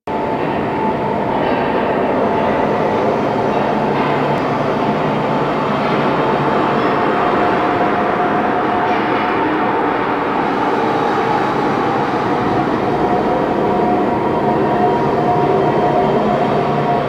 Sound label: Shipyards Interior Amb; Warframe; Ambience; Grineer Shipyards